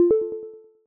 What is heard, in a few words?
Peer Join